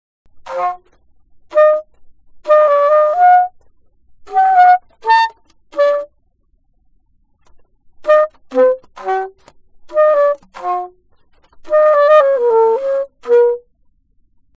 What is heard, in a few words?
altosax